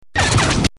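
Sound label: lightsaber deflect sound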